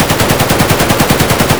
ak74 loop indoor distant; Escape from Tarkov

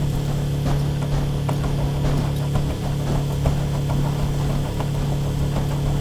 recycle loop; Rust

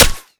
concrete small

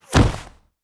bullet impact snow; Rust

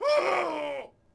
Warr Death Vox; Civ4 beyond sword

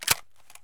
pump drop; Escape from Tarkov

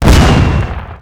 105mm boom; Explosions; Call of Duty Modern Warfare

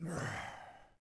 Exhale; Civ4

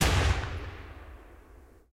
mg 8mm vickers303 lastshot; guns; tanks; War Thunder

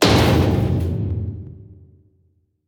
damage hits explosions; World Of Warships

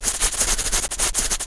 attack; Rust

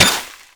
pick remove snow

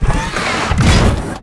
biomech air rise

